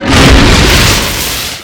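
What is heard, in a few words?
fire vehicle flame up medium big; Explosions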